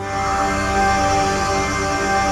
GALAXY; NorthStar - The Wizard VOL-2; THE VOID

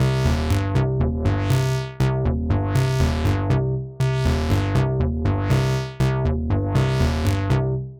120 Cm bass, bass loops, trance

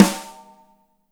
DRY SN; Spectrasonics; Burning Grooves; BG SNARES 4

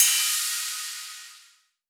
Rides, Cymbals

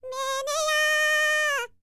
BULGARIAN, World Voices, BALKAN

